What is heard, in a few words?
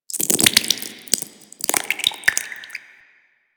Infested Ancient Taunt Flesh; Warframe